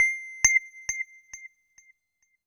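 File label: C5, Roland JV 2080, analog sequence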